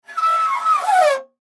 Scrape Metal Grind, Foley Scrape Metal Grind Squeak; Metal (Sound Effects:Materials); Blastwave